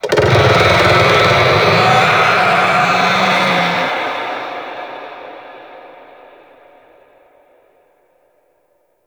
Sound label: Infested Golem Vocal Attack; Warframe